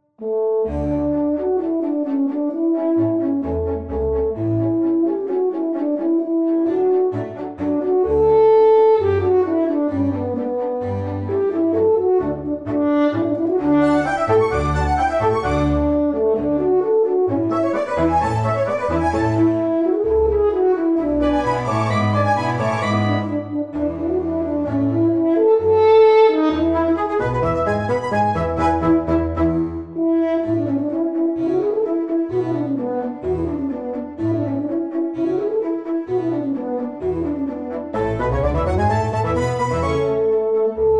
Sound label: Horn Concerto; Mozart